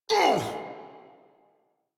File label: pain; Warframe; Tyl Regor Boss; Efforts